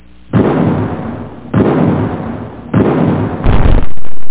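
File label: grenade; Geneve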